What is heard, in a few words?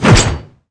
spear attack, weapons; divine